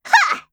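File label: attack, Voice Line, Korean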